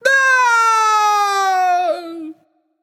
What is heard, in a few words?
fear; Smite; baronsamedi